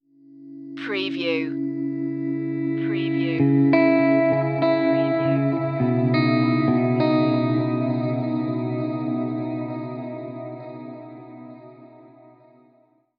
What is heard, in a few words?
sedated stinger